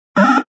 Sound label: Video Game Sound, Video game sound; Part of Video Games (Sound Effects:Games)